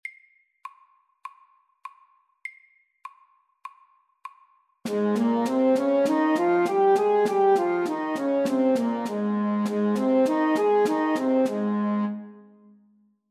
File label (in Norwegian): Ass dur FORT; Ass dur natura